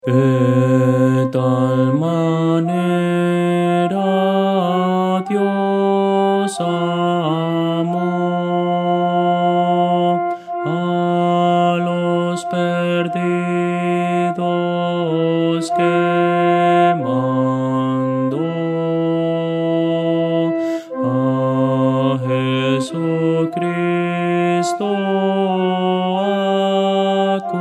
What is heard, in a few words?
contralto